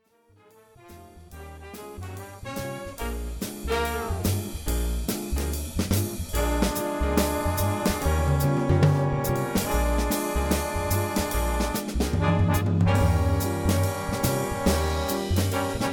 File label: drums